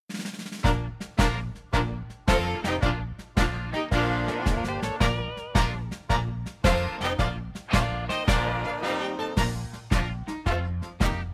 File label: Backing